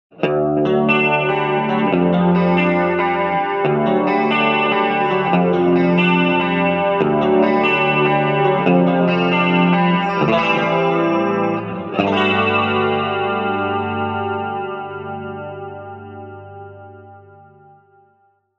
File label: Crush Clean Chorus FX In FX Loop